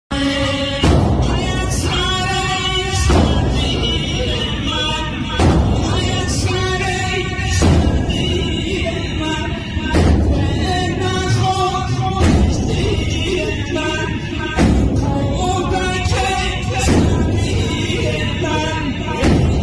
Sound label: sample moharam